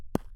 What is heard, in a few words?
grass; ball